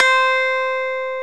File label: GTR SYNTH, Clean E.Gtr